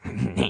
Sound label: menu move; monkeyspank